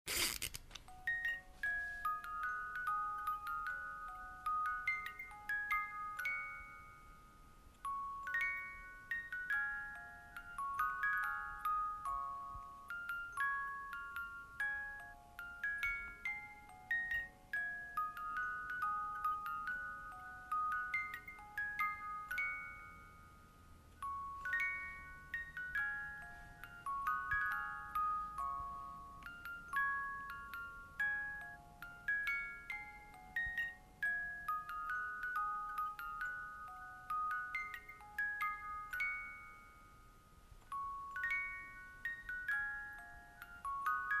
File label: rock a bye baby toy; giraffe